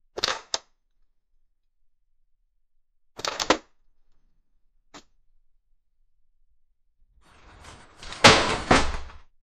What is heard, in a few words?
drop item, inventory